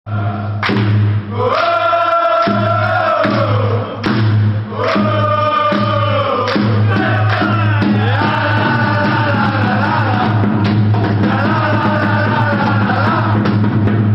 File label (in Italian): dale cavese, fanchants